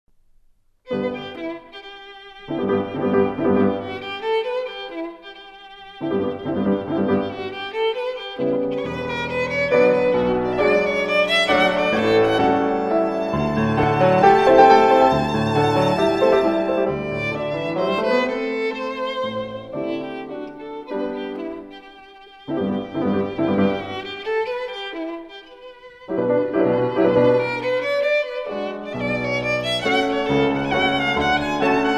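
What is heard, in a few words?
violin sonata; sjogren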